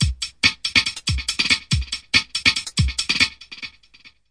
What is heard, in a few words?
funk no filters, drum loops and breaks, 141 bpm; 141 to 160 bpm